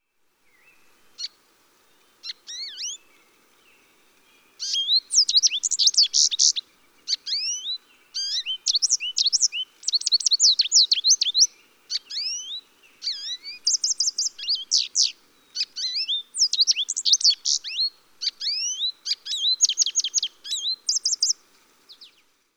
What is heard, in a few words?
American Goldfinch